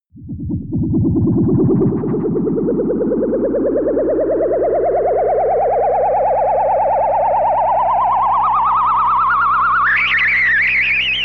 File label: speed increasing ufo